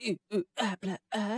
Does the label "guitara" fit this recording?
No